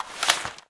Weapon Change; Guns; FPS Kit Version 2.0; slendytubbies

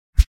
Delete; BlockSpace v1.5.0b

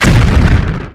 Rocket Launcher Impact, Guns; FPS Kit Version 2.0

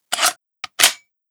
Reload, Guns; FPS Kit Version 2.0

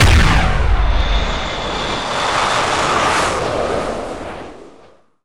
Rocket Launcher Fire, Guns; FPS Kit Version 2.0

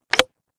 FlashLight On Off; Guns; FPS Kit Version 2.0; slendytubbies

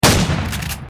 Shotgun, Guns; FPS Kit Version 2.0

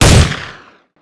Automatic Rifle Fire; Guns; FPS Kit Version 2.0

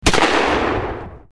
Sniper Rifle Fire, Guns; FPS Kit Version 2.0

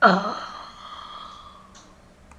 moan, Hurt Sounds; finalmoan; FPS Kit Version 2.0

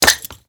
Grenade Throw; Guns; FPS Kit Version 2.0

